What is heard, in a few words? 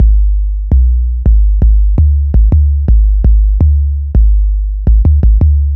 808 Standard, Bass Lines 166.5; Jungle; Zero-G Jungle Warfare 1, 2 & 3; 90 s Sample CDs